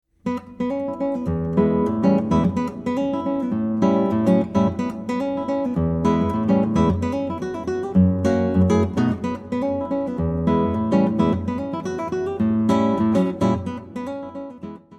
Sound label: gospel horn